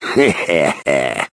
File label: LAUGH; BattleSNDS